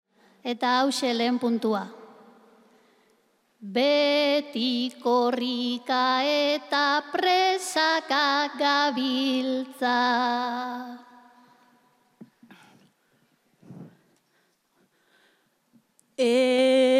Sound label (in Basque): ganbara puntuak, bertsoa; bilbo; Arzallus